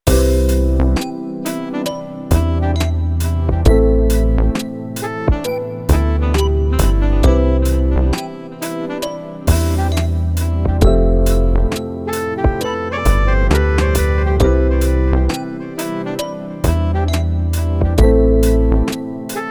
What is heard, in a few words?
lofi melody